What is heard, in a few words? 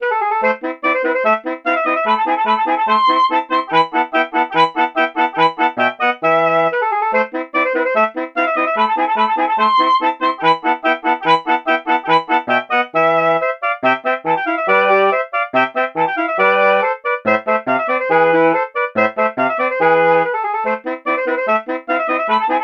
alto sax